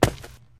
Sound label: tile footsteps, infected walk